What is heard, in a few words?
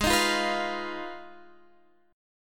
Ab Minor 6th Ab, down, Guitar, Open F; Abm6; strummed